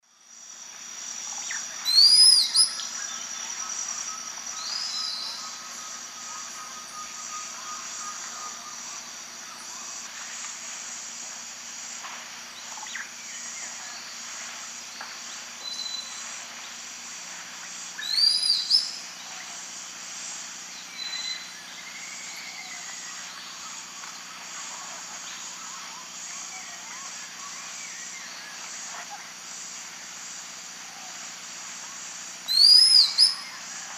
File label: Harpagus bidentatus, calls; S. America; Eastern Lowlands